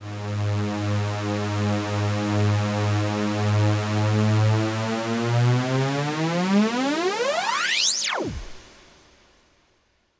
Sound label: Force Riser